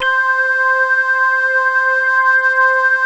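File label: JUNO ORGAN, PADS; Masterbits; Vintage Voltage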